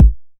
909 BD; Goldbaby Tape808 & Tape909; The Tape 909

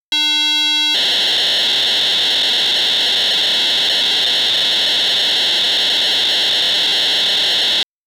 PO-32 data transfer sounds; Tonic Drums

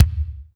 Kick, Alesis DM5

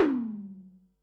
tom, Pearl SC-40 Toms

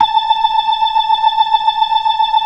93 BLOOD R 1, ORGAN; Keith Emerson Signature Series

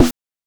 BANK1 SD, NES DPCM; MIDINES

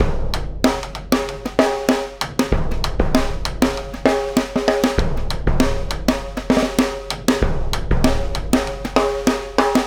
Big Drum and Snare; Sonic Foundry (Sony Creative Software); Essential Percussion; Drums; Big Drum Combos

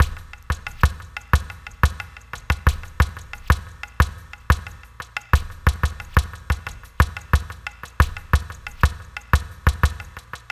Ethnotronic, Processed Percussion

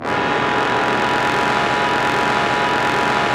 BRS, LOW BRASS; Roland - LA Composer vol. 1

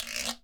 GUIRO

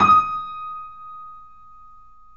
D#, The Piano; InVision Interactive

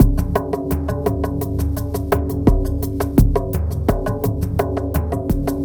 DEEP TRIBAL MACHINE GROOVE; Sonic Foundry (Sony Creative Software); Vir Unis Infusion

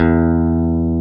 GUITAR E; 4 El. Guitars

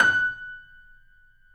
F#, The Piano; InVision Interactive - The Piano

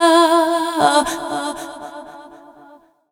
FEMALE, Dance Vocals

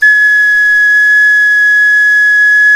CLAYFLUTE, Heart of Africa

